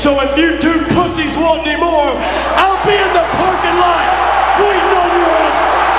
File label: parking lot